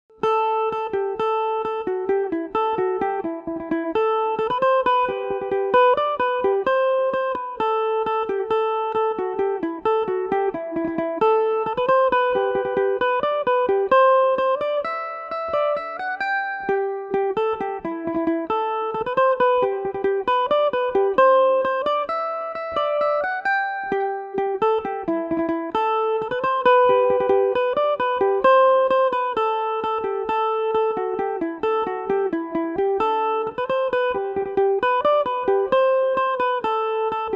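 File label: jig A dorian, Irish mandolin; kerry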